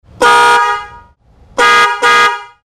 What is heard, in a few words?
Dual Fiamm Horns; Vmax